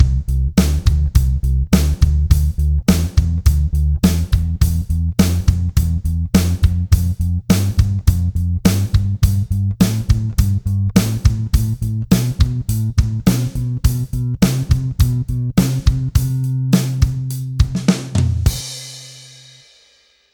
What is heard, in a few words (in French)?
Basse Batterie Seconde min maj 104 Bpm